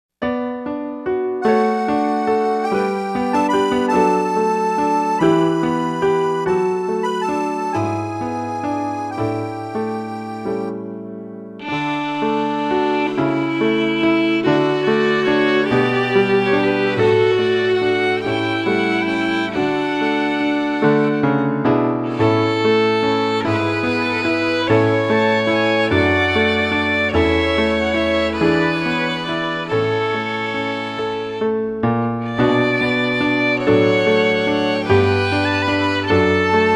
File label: D scale waltz